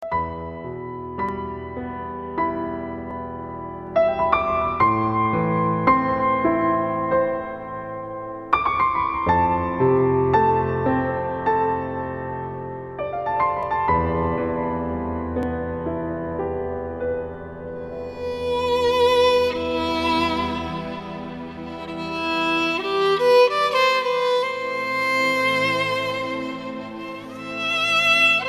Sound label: Instrumental Music